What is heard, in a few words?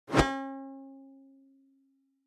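Pop Up Electric Whip Guitar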